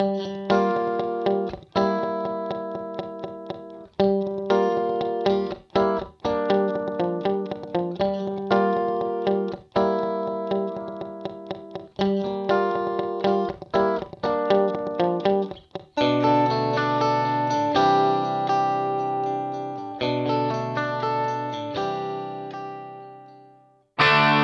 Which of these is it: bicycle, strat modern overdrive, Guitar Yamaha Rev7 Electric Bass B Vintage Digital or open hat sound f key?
Guitar Yamaha Rev7 Electric Bass B Vintage Digital